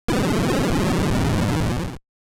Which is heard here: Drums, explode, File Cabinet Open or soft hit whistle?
explode